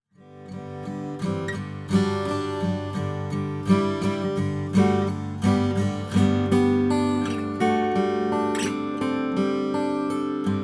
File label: em c brazilian rosewood, guitars; claxton